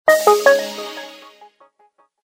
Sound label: Power Off